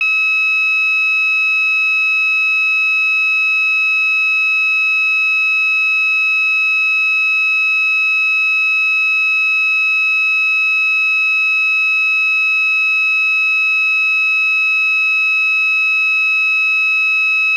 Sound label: SINGLE TONE, Piezo Siren